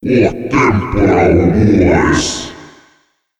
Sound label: boom; ragemap2023; wizard